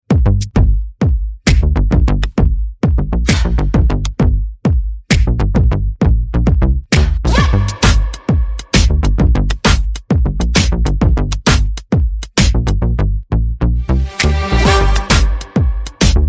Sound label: Sunshine Strut, Light Percussion